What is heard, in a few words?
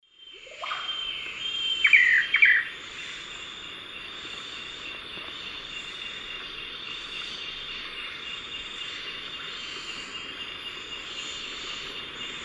Chiroxiphia pareola, song; S. America; Eastern Lowlands